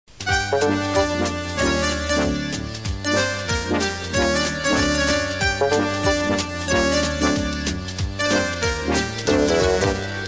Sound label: theme; Nick Knatterton